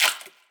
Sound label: clap single hit d key; percussion; single hits one shots